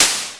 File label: Thin Ana, Drums, Snares